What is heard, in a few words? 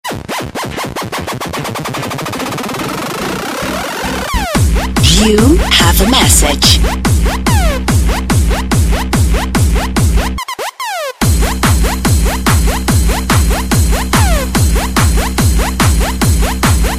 Crazy Sms, SMS Tone